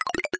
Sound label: dice; TamTamMicro